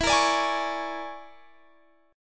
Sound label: A sharp 7th Sharp 9th Flat 5th E